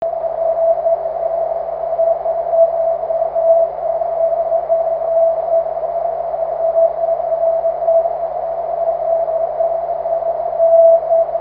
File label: cw; 23cm